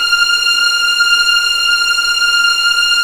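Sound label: F6 legato, The Ultimate String Collection; East West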